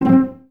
CES C 4 Pizz, Cellos, String Ensembles; Miroslav Vitous

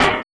Vinyl Snare; Sonic Foundry (Sony Creative Software); Esoterik Beatz; Vinyl101 Kit

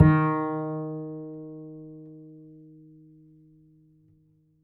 Upright Acoustic Bass

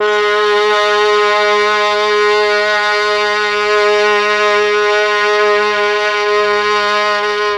MEL STRING; ILIO; Propeller Island

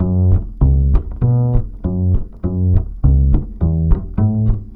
BASS, Balkanish Traditions

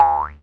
NOISE BAD; AMG - Vince Clarke Lucky Bastard

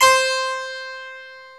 Harpsichord; E-MU Producer Series Vol. 2 – More Studio Essentials; Keyboards

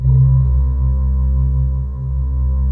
WAVING C2, Choir Acoustic & Synth; USB Soundscan vol.28 - Choir Acoustic & Synth; AKAI

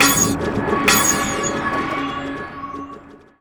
BUBBLE; USB Soundscan vol.33; Disjointed Textures; AKAI

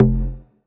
Bass F, Bass Synth

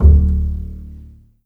D0 PIZ F BAS, string; East West; The Ultimate String Collection

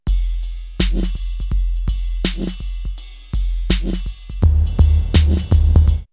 Electro, drum loops, drum machines; X-Static Goldmine

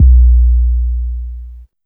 KICK XL, Big Beat; Big Beat Samples & More; AKAI

